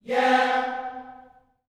"yeah", gospel vocal, C4; Spectrasonics; Vocal Planet Vol.1 Gospel; akai

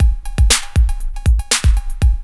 LOOP, DRUM; E-Lab; X Static Goldmine 2

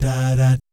DAH DAT, Jazz

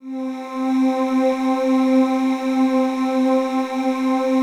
PAD; Best Service; XX Large; Pads

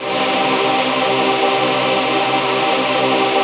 VOICE PAD, ATMO PADS; Best Service; XX Large

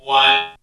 SFVX

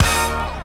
STAB

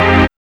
TEKCHD; E-Lab; X Static Goldmine 1

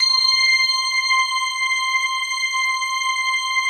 PAD, Pads; Best Service; XX Large

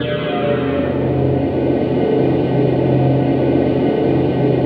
ATMOPAD; Best Service; XX Large; Pads

